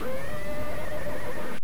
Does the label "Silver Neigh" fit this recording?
Yes